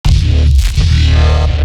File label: Growl Bass; neuro; BIG5AUDIO